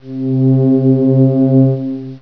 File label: cav organ; Prince of Persia 2 Demo